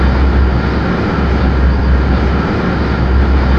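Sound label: Engine Thrust Interior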